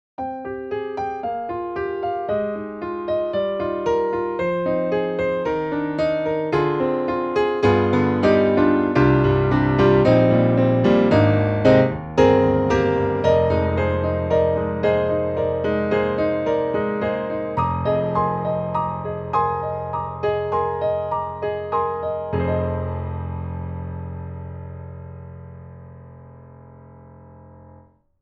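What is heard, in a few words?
VINTAGE D, piano; BEST SERVICE; GALAXY VINTAGE D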